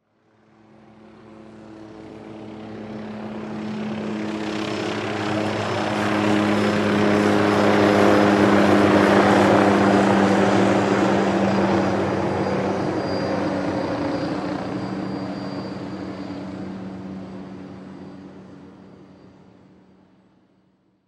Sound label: Helicopter Fly Overhead; News Chopper Distant; Transportation Aircraft; Helicopters